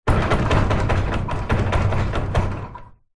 household garage door wooden closed impacts rattling; zapsplat